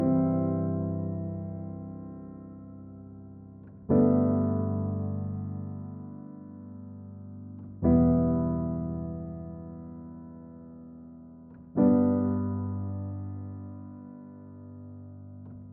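122 BPM Piano Chord Progressions Cinematic Drama C major A minor Tonic G; MMB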